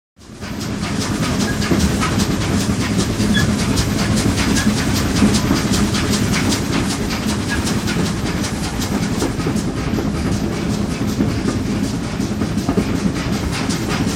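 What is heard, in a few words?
Steam Train Exterior Steady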